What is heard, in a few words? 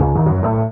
ORG RIF; INSTR LOP; E-Lab; X Static Goldmine 2; akai